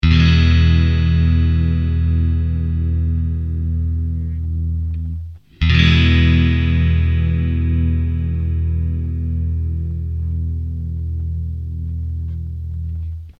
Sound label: clean middle coil; smoke stack ii; axetec